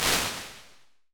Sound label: Nice Wood Room, Impulse Responses; Medium Spaces; Indoor Spaces; Apple